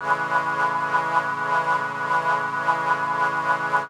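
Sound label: Pad Synth; Equipped Music; Loungin House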